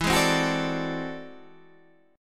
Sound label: A Minor Major 13th